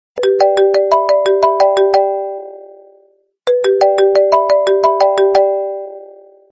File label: marimba